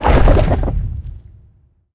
slot close; labyrinth; revivalstage

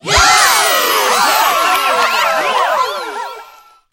end win

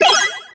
item get

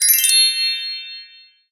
get item

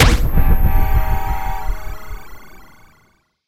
click Space Train

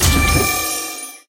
labyrinth revival stage slot result success

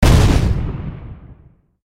hit bomb

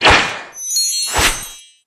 event stage item heal

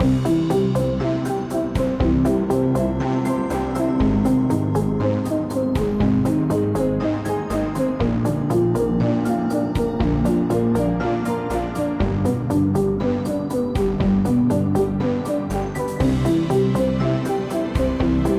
BGM lobby; SpaceTrain

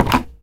click setting

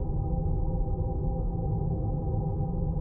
ambi lobby

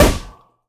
hit weap bomb pop

